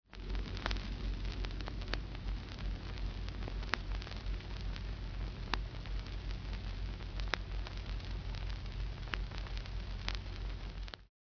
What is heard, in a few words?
crackle